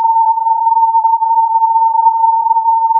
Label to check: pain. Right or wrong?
Wrong